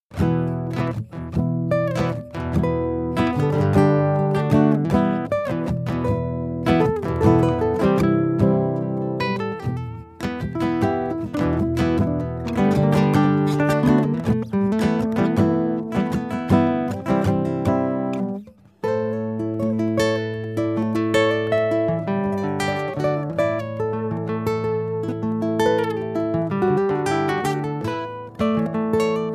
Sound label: Guitar LOOPER; Pirate